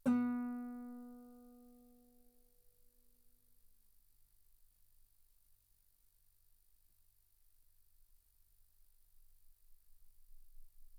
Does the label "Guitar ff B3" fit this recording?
Yes